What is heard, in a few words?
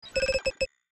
Electro, notifications